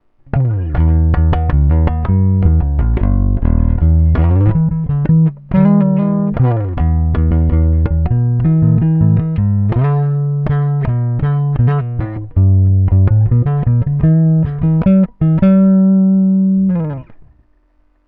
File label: Aria Diamond 1820 Neck